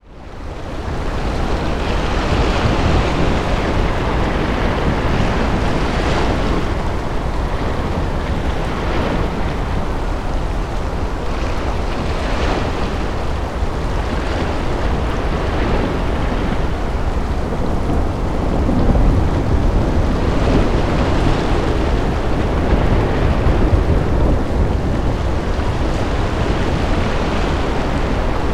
Weather Ambience Storm Sea Rain Thunder Waves Ocean Wind